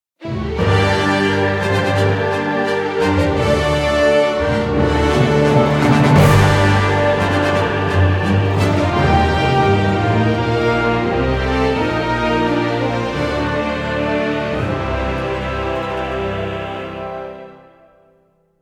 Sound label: MUS Recall; Smite; Arthurian